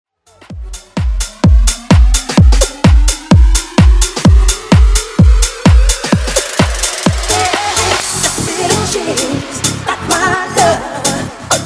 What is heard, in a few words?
Bass House 128bpm